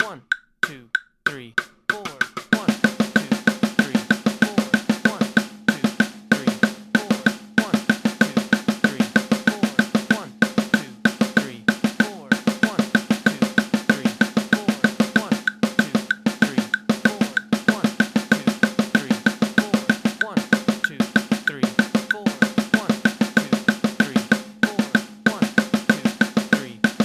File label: Sixteenth Notes 95BPM; Drum Lessons